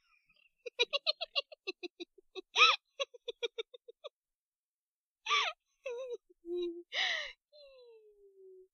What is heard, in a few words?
giggles